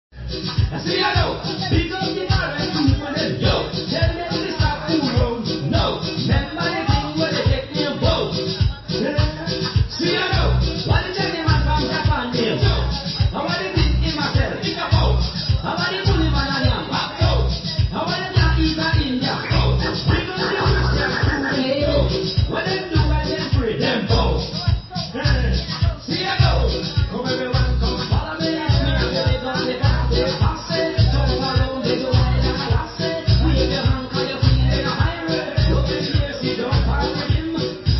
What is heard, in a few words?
dance; belize; punta gorda